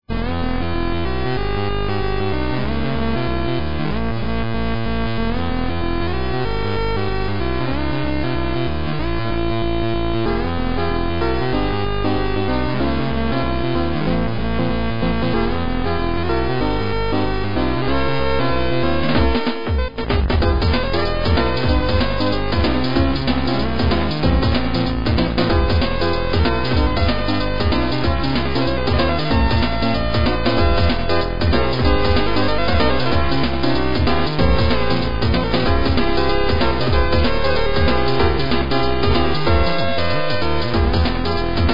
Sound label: song; sven